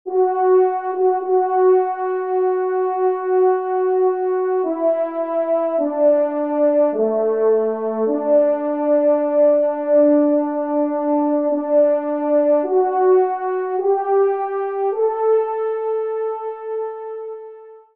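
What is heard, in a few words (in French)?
Trompe Orgue, Solo; Kyrie; messe cantin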